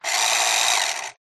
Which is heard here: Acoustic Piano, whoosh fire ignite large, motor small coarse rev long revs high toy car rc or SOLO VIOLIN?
motor small coarse rev long revs high toy car rc